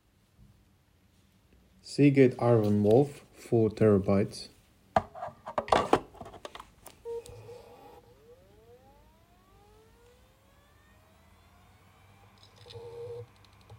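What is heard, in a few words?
seagate ironwolf 4tb, hdd noise; What are the Quietest hard drives (HDD)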